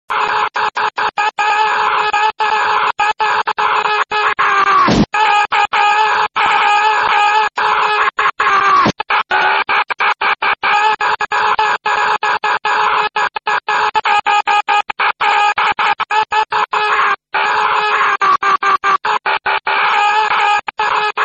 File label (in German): schreien